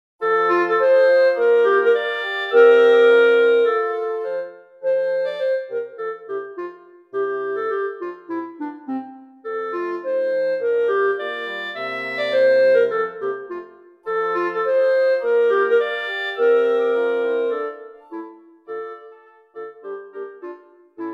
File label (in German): Klarinette